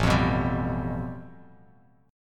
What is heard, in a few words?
A 7th Suspended 2nd Sharp 5th, Bass Standard